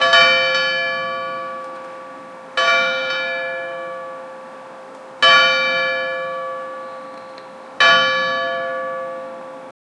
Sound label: church bell ringing